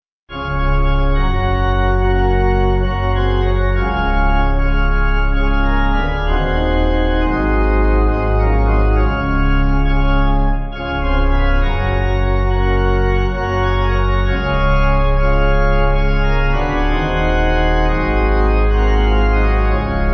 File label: Pipe